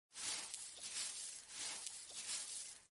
broom Clean

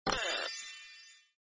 open chest

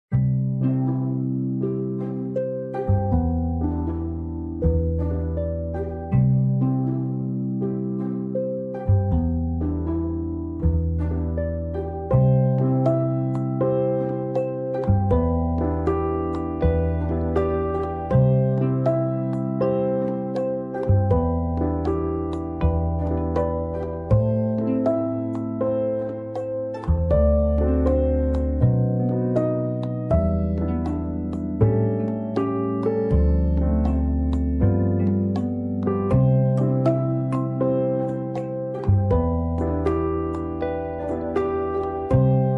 music Front Yard

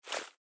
accumulate

